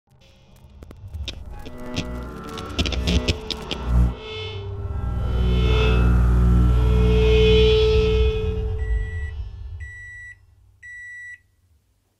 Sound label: Stutter Transition